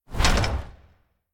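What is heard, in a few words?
small swing; Smite; Cabrakan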